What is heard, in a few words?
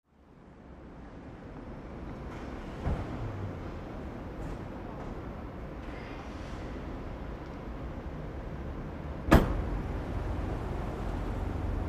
City Industrial Ambience: Various Truck Starts, In And Away, Background Walla, Work Bell, Horns.